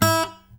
pop, Guitar

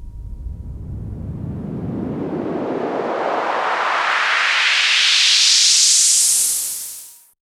reverse; Techno Trance Essentials; FX & ATMO

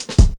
Fill, Programmed Stepz, drum loop hits; Zero-G Jungle Warfare 1, 2 & 3; Percussion Hits

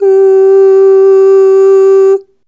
Ooh Short G3 Dry; Harmonic Building Blocks

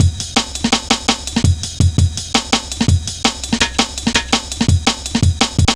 jazz ridez, drum loops; Zero-G Jungle Warfare 1, 2 & 3

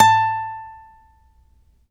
ACC FG A, Acoustic Guitar; East West; Steve Stevens Guitar Samples Collection

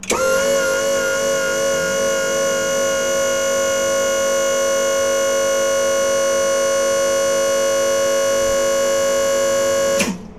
small servo; E-MU Producer Series Vol. 3 – Hollywood Sound Effects; Science Fiction

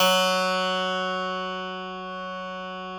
Harpsichord F1; Classical Prepared Pianos; Best Service ProSamples vol.53; Total Piano

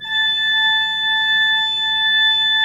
3D Pipe Mix Celeste, 3D Organs

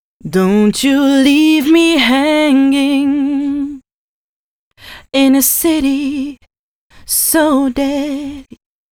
female c# 138, hooklines 136-140 BPM

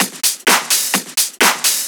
Discohouse Loop 128 BPM

